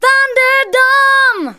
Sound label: VOCALS SUNG; Techno Trance Essentials